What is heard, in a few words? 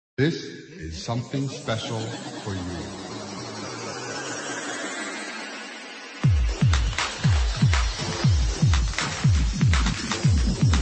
Delay And Pitch; Mario Nieto World Delay And Pitch v0.1